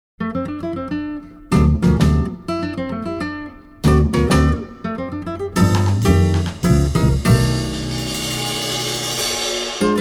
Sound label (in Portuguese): Samba novo